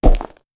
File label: generate a single footste, dungeon